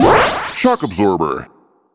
pickup; fortress blast; shock absorber

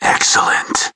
cheers; tf

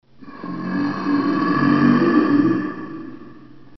zombie; dod

